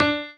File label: piano melo